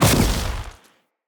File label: Shrimp Lantern Weap Cluster Proj Split C; Creatures; Baliq